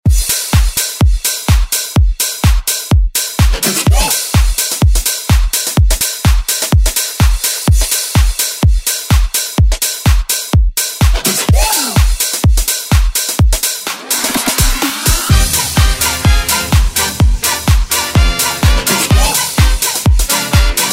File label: House Redrum